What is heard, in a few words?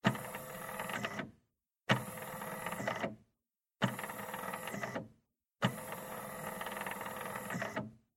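sounds of CD player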